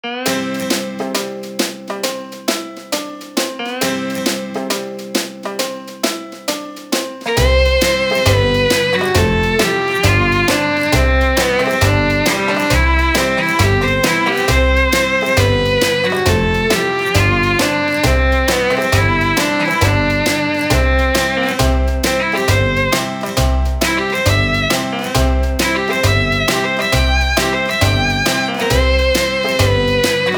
Wedding March Fiddle Violin Banjo Country Western Folk Remix